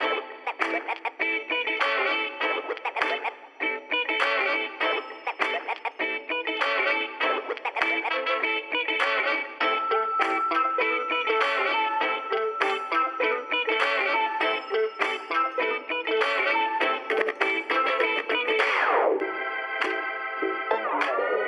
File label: Music Dock Loop; Subway Surfers Tag